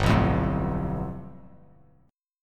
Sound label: G 9th, Bass Standard; strummed